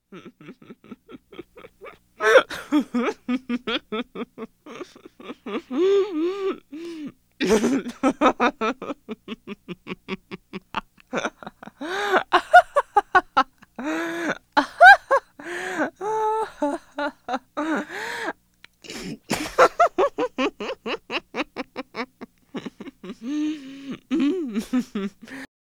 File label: Witch Giggling; Halloween Sound Effects